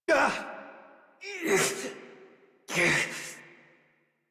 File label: Struggle Long, Adult